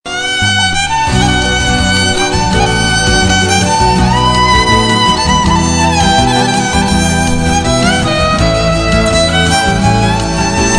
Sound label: Bandari